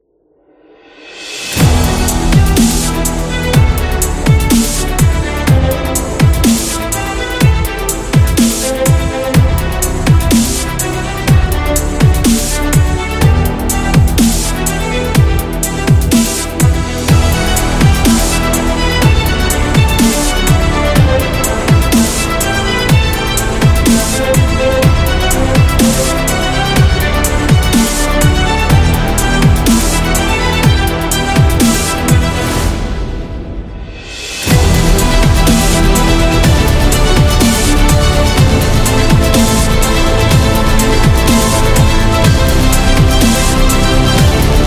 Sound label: Epic Motivation